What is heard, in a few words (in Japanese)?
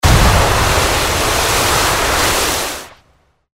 水魔法 大 モノラル; 魔法効果音